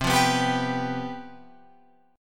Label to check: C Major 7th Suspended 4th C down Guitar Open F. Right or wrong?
Right